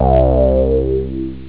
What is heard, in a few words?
Bass; SynthSamples2